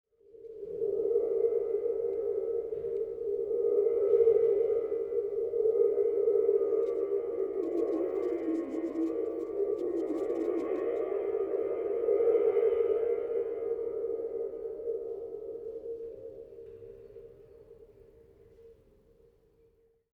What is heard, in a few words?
storm drum